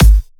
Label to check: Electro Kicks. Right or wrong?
Right